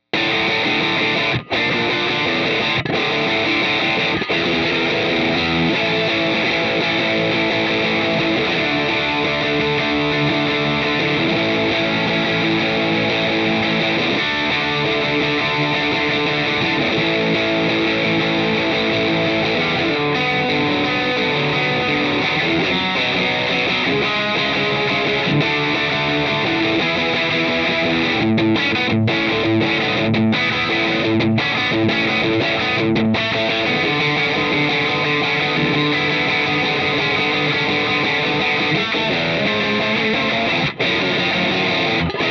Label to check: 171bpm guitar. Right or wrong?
Right